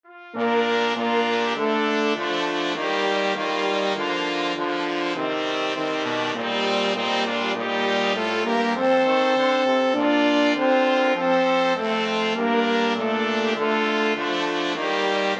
national anthem russia, flexible mixed ensemble 4 players